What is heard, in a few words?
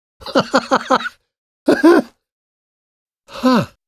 laugh; citadel; hl2mp